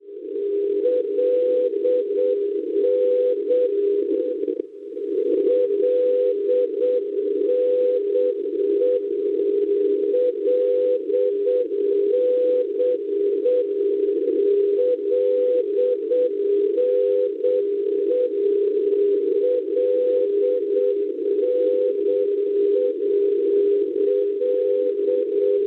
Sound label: NDB Linate Italia 386 kHz; LNE; Schwarzsee